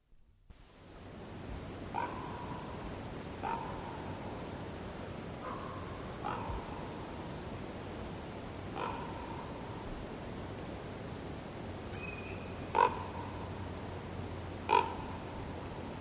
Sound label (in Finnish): susi korppi; katoava pohjola